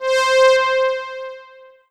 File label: Korg DW 8000 Synth Strings C5